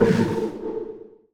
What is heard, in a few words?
scurry end; dota; hoodwink